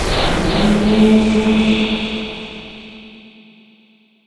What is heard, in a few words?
revenants cast; muerta